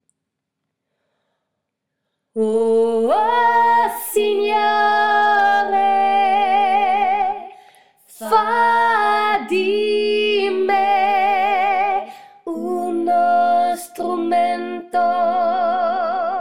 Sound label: O Signore 1ere voix